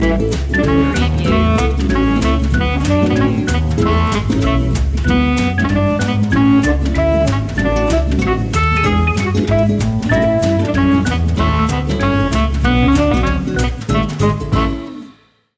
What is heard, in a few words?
deck the halls jazz